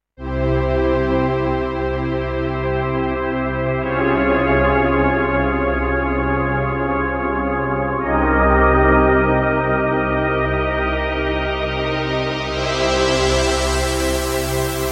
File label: Pad Controller Action